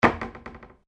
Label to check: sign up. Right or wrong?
Wrong